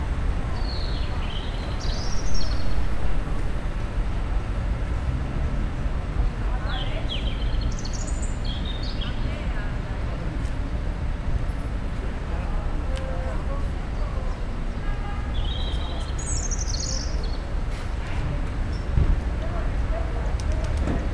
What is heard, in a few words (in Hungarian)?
madárcsicsergés park és forgalom